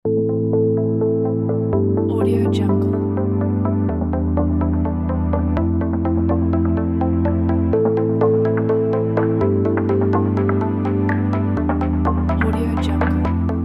ambient tech background

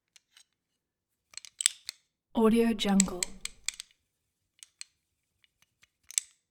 spinning revolver handle; collanderson

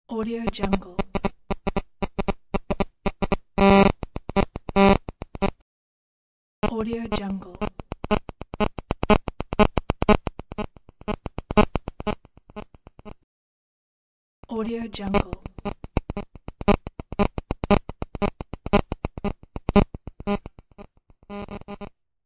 mobile phone interference

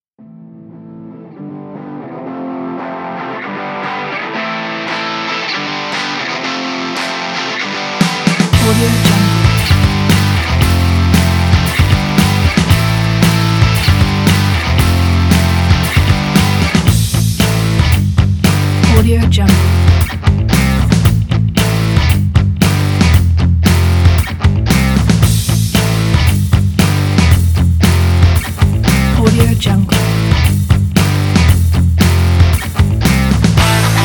upbeat travel

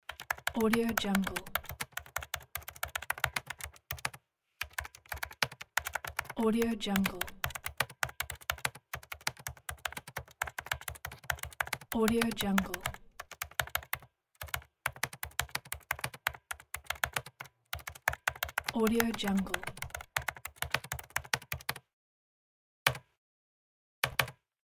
keyboard typing

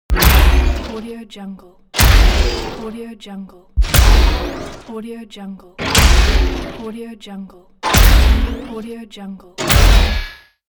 gaint robot footsteps

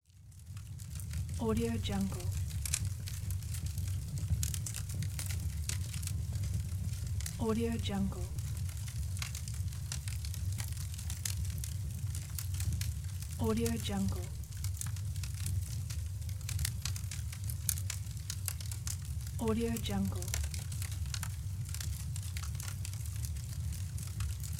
crackling fire outdoors

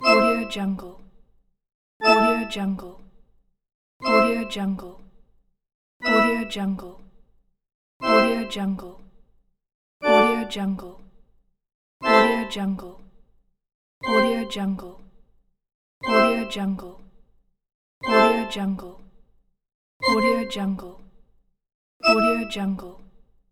menu button

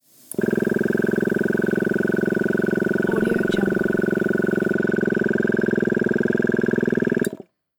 air compressor

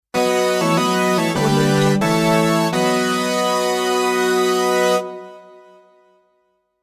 fanfare

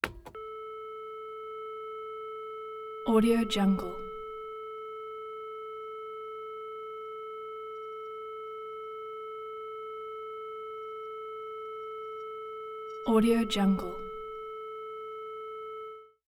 phone dial tone constant clicks at head

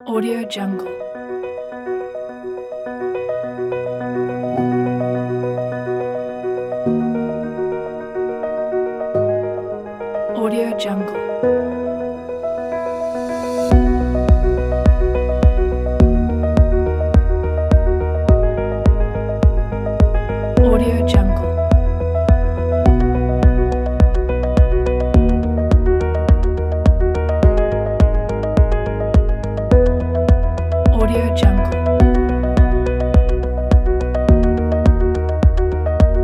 ambient inspiration kit

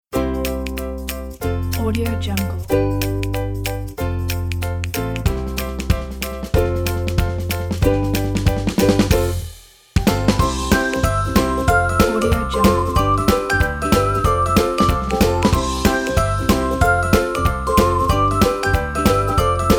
ukulele and whistle

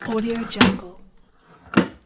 desk open close

